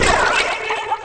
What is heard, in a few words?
DROWN; puffin